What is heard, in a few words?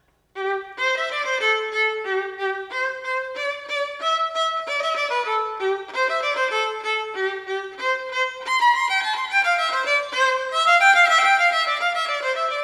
violin